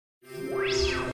Dj Bell Sms

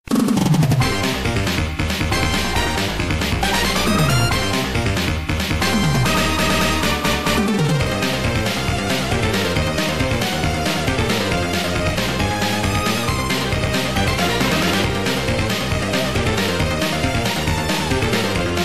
arcade; tmnt

